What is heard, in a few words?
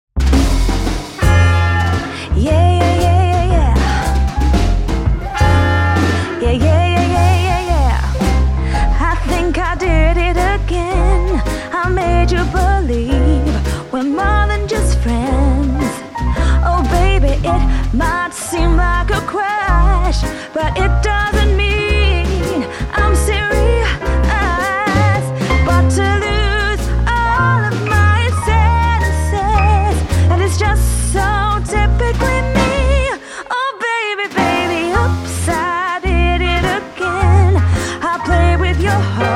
Modern Vintage Swing Band